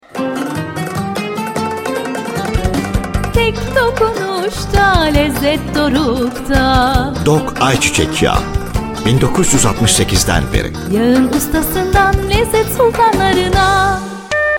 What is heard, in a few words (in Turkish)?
vokal jingle; dokyaglari